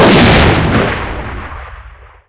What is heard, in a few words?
sound sniper scout; cstrike; ZP CRISTIAN